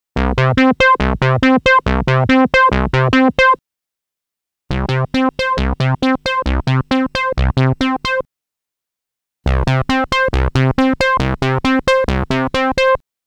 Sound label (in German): vergleich bass